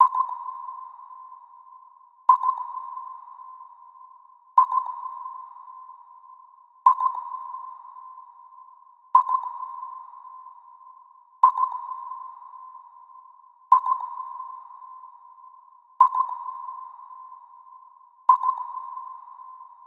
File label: transport submarine sonar ping